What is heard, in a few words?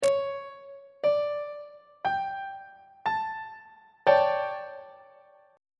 d major 7th suspended 4th 3rd inversion on treble clef